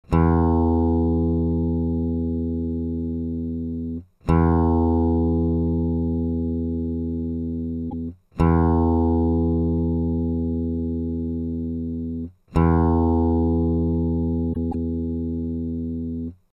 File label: E STRING, guitar